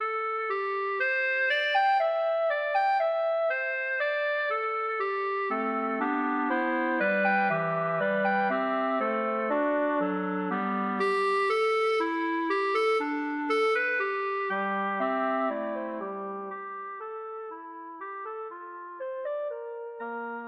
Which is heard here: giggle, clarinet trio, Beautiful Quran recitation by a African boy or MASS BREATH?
clarinet trio